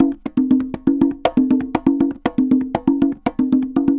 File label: Congas Samba 120 bpm; Best Service; Festa Latina VOL-1